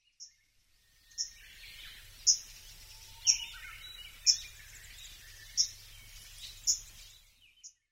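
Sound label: Arctic Warbler, sakata; koe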